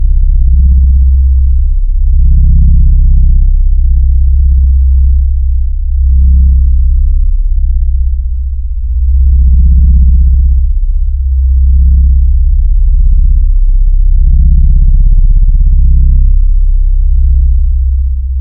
Deep Forcefield

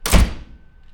Wood Door Slam

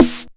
High 909 Snare

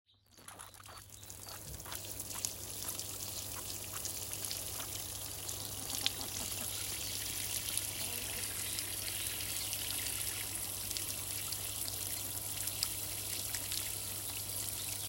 Watering Sound

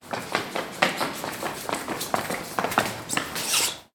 Stairway Down Run

